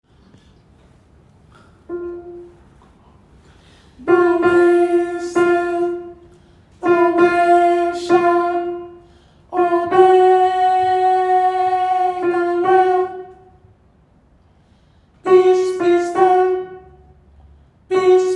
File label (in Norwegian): sopran, gospel; kongsberg; kor; gospel for alle